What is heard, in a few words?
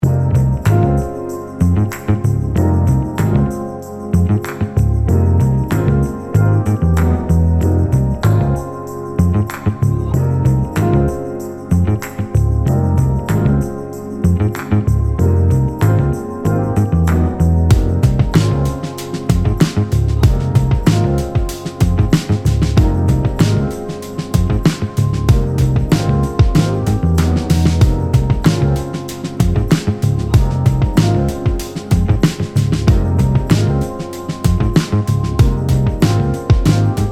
Gospel Loop